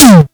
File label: Syntom; Yamaha PSR E305